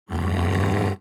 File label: wolf lord threat